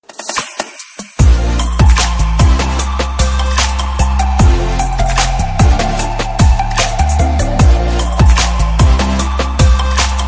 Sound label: bgm ringtone